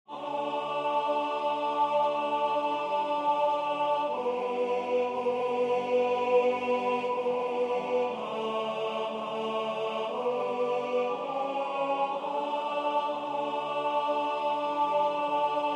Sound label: Deep river A